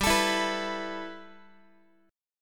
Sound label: G 6th Add 9th, Guitar; G6add9 strummed; x,15,12,14,15,x